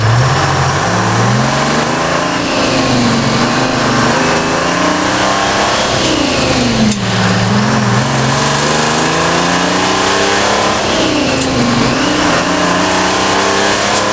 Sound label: first to fourth cruise, vehicles; mer g65